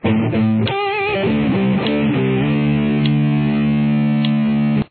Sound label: solo